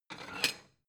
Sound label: plate small take slide